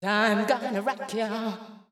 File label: VOICE GRL HOUSE 125, House Voice; eJay